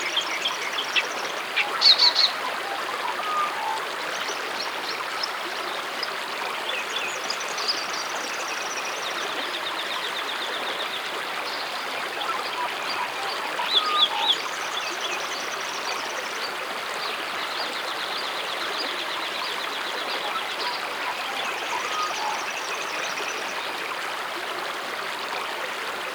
Ambient; Kalamee

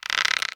Tree Squeak

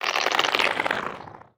Creak; moul

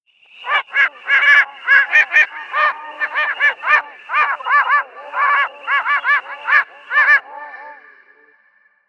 Plant Ext Rnd Lake Birds

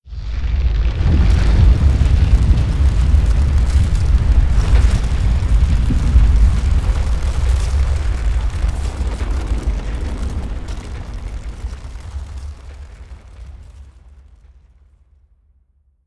Cave In

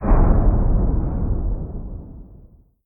Timer End

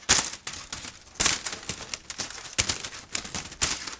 Dirt Slide

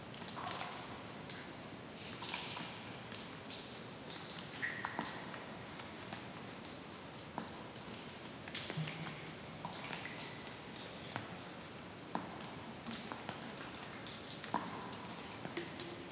Cave Water Drips Loop

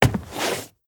Soft Wood Turn

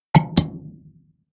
Scope GUI Button

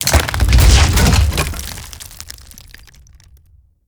Bridge Collapse

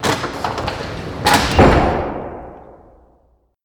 Library Doors Close

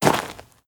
Dirt Walk

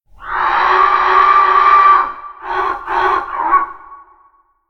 Sandscrit Creature Vx; moul

